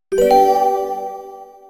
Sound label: goal